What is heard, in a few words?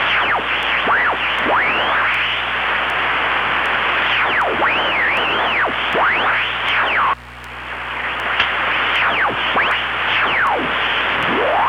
Radio Interference, Short Wave; Sonic Foundry; The Electronic Music Manuscript Collection; EVP Short Wave Recordings